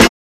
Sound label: Snares